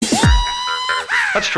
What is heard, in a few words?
yehaw